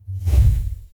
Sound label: a heavy object sliding; abandoned place